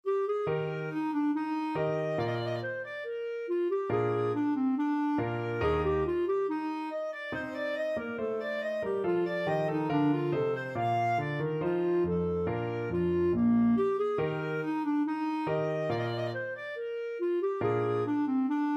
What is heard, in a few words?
bourree, clarinet; bach